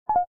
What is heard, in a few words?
call disconnect; Alcatel OT-903